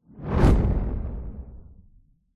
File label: sound design whoosh flames fire cinematic; zapsplat